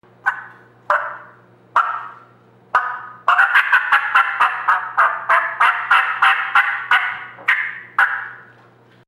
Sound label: fly down cackle